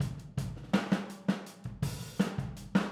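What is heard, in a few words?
Boogaloo, Overhead Stereo, 82 BPM; Drums; Verse; Sonic Foundry; Drums from the Big Room The Mixes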